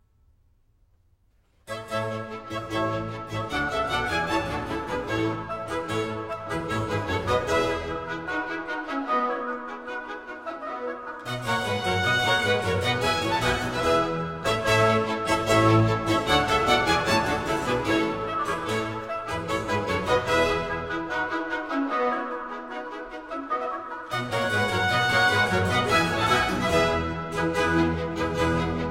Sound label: overture in b flat major; English Concert